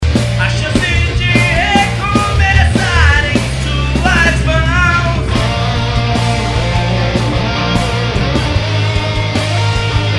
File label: waterfall